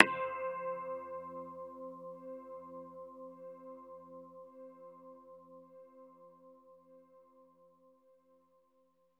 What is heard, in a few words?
FIREWIRE, Synthy Tonal; Distored Reality